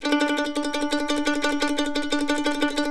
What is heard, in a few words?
C E trill D, Blues Guitar